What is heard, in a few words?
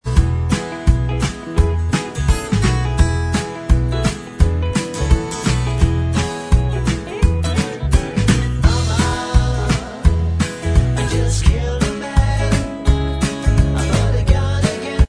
in style of Bvox Track